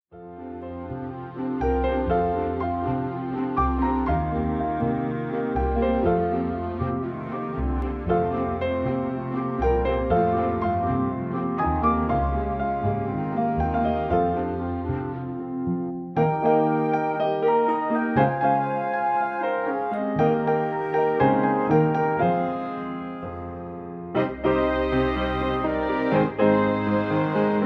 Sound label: Soaring Seagulls